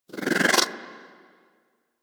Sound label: Speedball Catch Vortex Activate; Missions; Tenno Ball